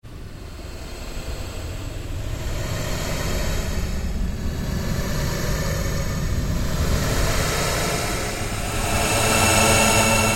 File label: Ambient Drone Slow Sweep; Sound effects created by manipulating other sounds; Sound Design